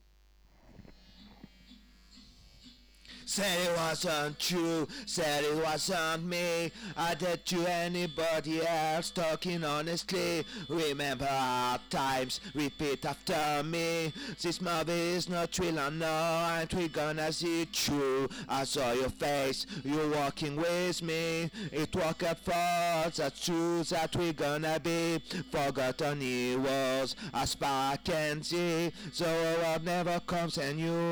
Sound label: Vocals First Part; REMIX KIT